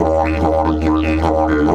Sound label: 140, DIDGE RIFFS